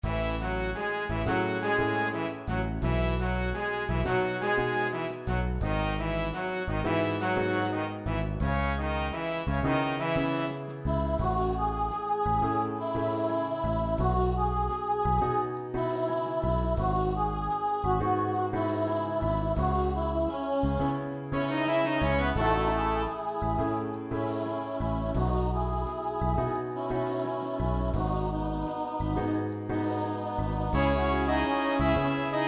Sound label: Full Band MIDI